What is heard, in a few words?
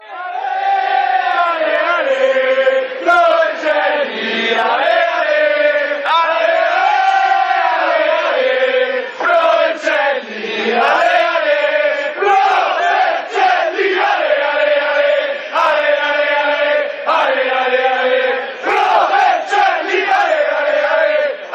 ale, fan chants; pro vercelli